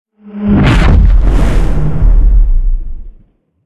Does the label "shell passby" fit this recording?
Yes